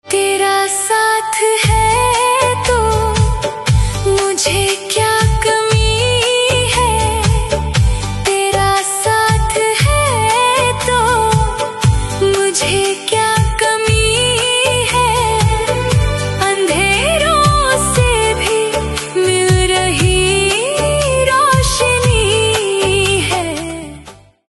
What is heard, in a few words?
AI Female Ringtone